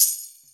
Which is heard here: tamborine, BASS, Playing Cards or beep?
tamborine